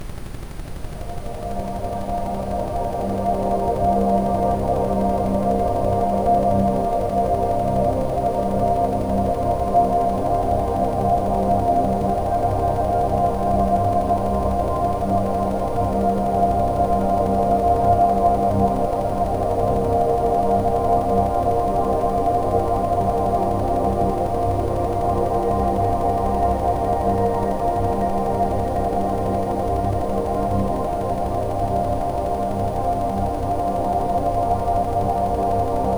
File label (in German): Rife Kristallklare Klarheit mit Ambient Sound; rife frequenzen